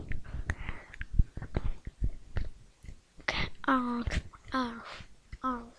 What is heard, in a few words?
laugh